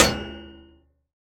ExoHit

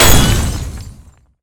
Blazing Core Parry

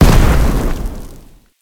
Mortar Explode

